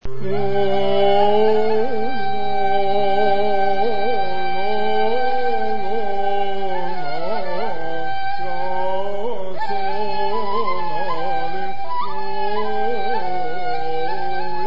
Logon, Ney Taksim; Bestenigar

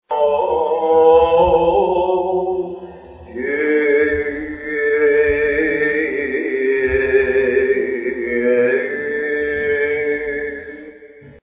Ton Despotin; Interval; Pringos; 1950s

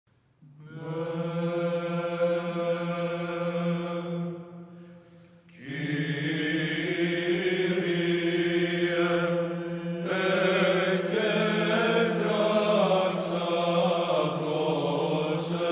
Choir, Kirie Ekekraxa; Adelaide

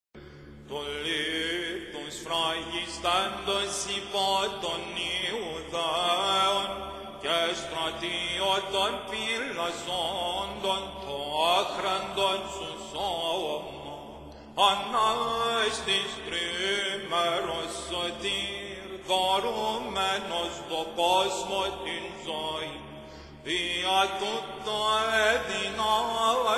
accent based rhythm; Tou Litou Ephesiou

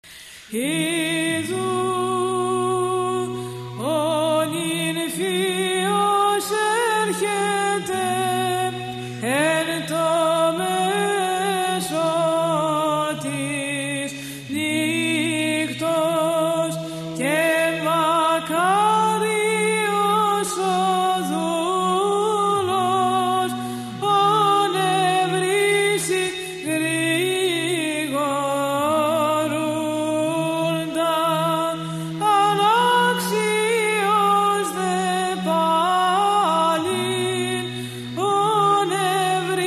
Paschal chant

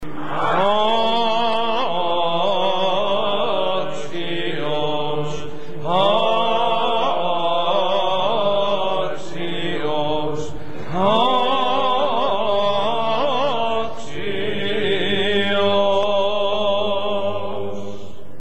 Axios Axios, Cathedral; Athens

